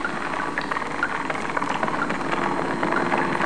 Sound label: slop; Amiga 8-bit Sampled Voice; HORROR